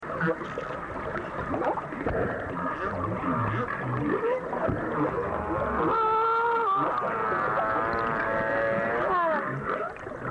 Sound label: social sounds, cetos